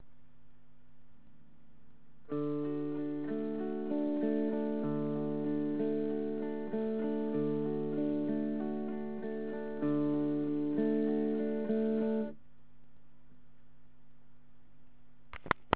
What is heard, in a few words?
arpeggio